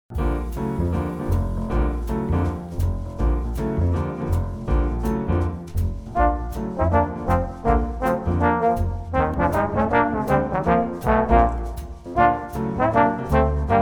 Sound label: 2 TB Deck The Halls